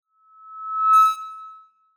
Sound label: Microphone Feedback - Smooth Classic, Some Distortion; Hollywood Edge; sound designer's toolkit v2